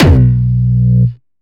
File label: Hard style kick